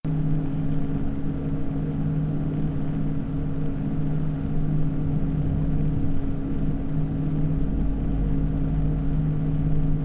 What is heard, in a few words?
1000 RPM; Blacknoise